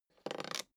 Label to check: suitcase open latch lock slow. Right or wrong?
Right